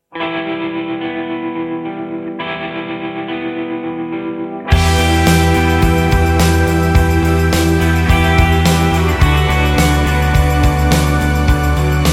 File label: raindrops